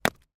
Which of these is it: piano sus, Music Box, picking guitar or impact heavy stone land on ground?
impact heavy stone land on ground